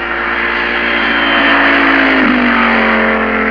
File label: car speed